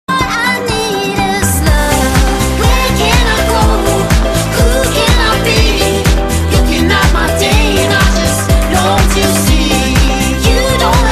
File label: flute